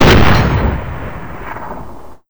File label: cannon fire; weapon